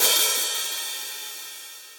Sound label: Hihat Open Shank; Drum Hits; Standard Hits; Room With A Vista; Ableton; Factory Packs; Session Drums Studio